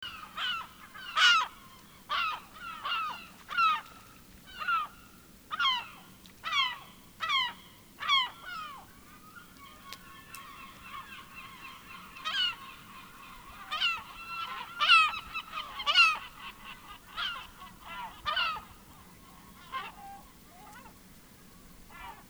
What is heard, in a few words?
Larus mongolicus howking